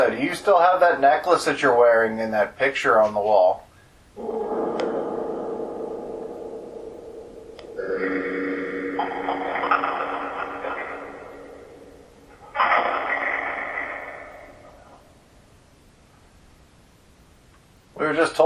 Phasma Box; Third Floor Hallway

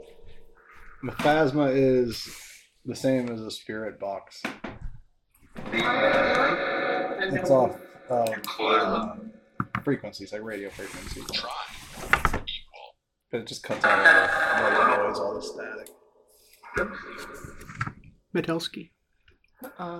Tarot Card Room Phasma Box

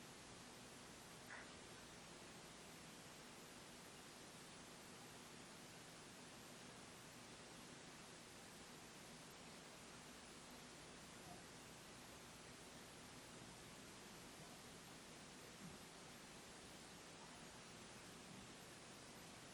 Inside Tattoo Shop

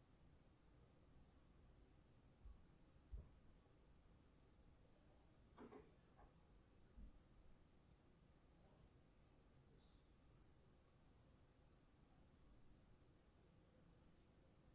Static 2nd Floor Bedroom

